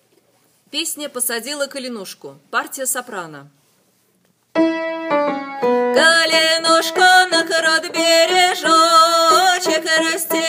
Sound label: Soprano; Posadila Kalinushku